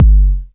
boom bass drum sample g key

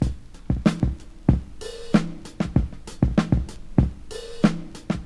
95 bpm fresh breakbeat sample b key

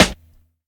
fresh rap snare drum sample b key; snare drum samples

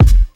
00s short kick f key, Loudest frequency: 230Hz; kick drum samples

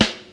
raw acoustic snare sample f sharp key

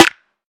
shiny acoustic snare sound e key

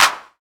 smooth clap sound c sharp key

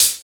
studio hat sample B key, Tuned drums

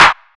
hand clap E key; percussion; single hits one shots

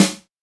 snare drum sample g key; snare drum samples

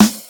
00s rap snare drum sound g sharp key

